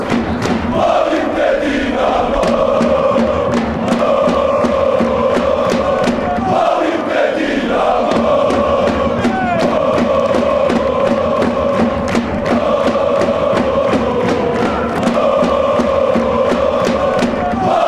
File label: dinamo zagreb chant